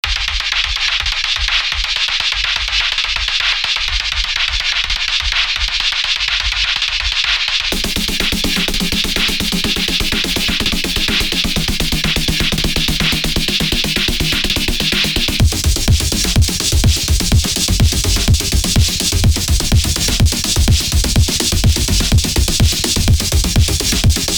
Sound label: music